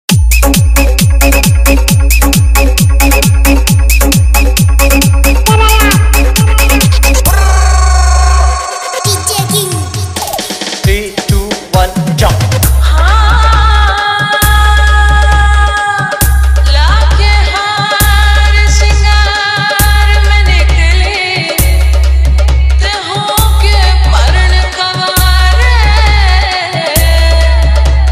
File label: Dj Remix Hard Bass Vibration Mix Punjabi